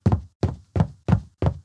Zombie Run Loop

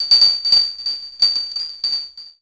Bell; Higurashi no Naku Koro ni Matsuri; PS2

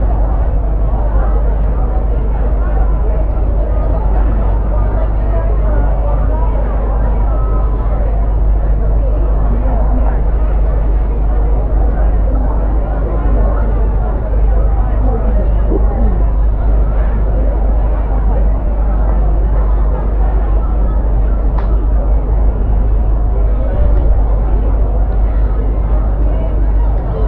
Crowd Ambience; Elevator Ending

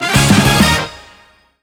Goal Failed; rapala we fish wii gamerip 2009

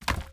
Drinking Fountain; gamerip

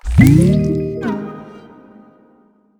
Level Reveal

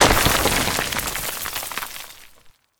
Sarco Horus Eye Explo

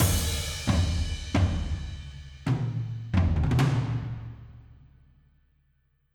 Loop End

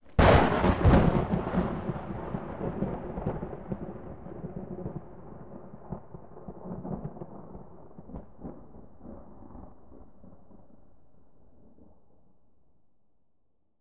Halloween Audience End

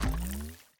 Holo Mural Power On; viewfinder

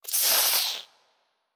Scarab Scream

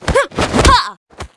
Generic Kick F

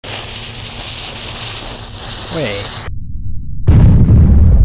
Bomb

